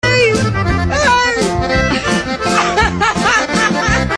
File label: ayyy ayyy ajajajaaaa